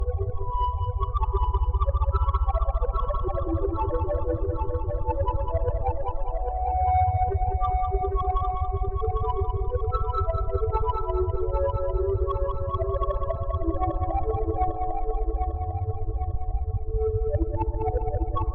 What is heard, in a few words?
Alien Tonality